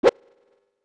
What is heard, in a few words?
Sword